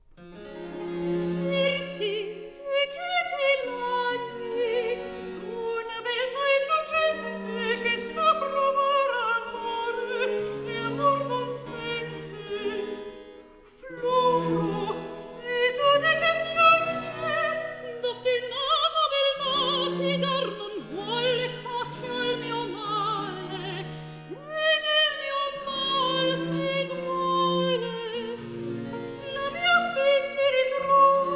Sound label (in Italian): Recitativo; Tiris, di che ti lagni; Baroque; Alessandro Scarlatti; Duet Cantatas